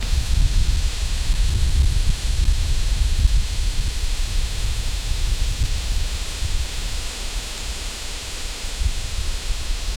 wind through trees

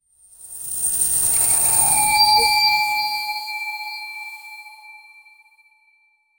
short chime like wild wes

